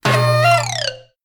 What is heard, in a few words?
notification; samsung Aleart